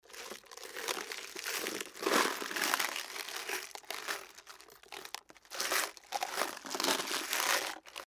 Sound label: tool box